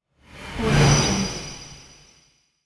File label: whoosh; ldj-audio